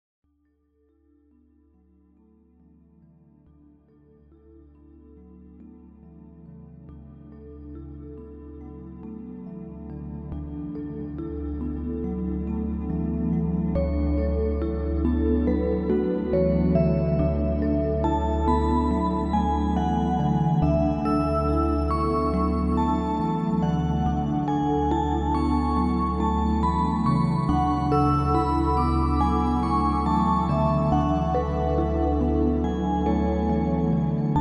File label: Rain Chimes